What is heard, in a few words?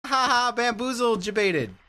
raven haha